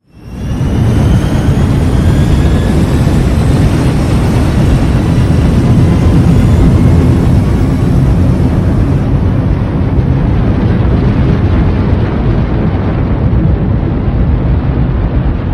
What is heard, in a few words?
launch; ships; Movement